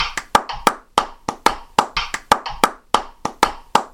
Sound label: reversed clapped; 12 claves